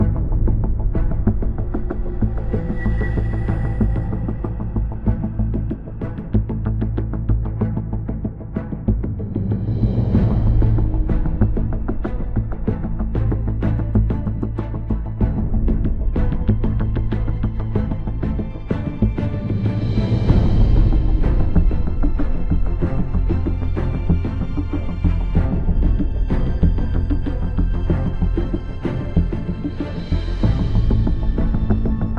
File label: idle ambient music main; Recode; rampage riches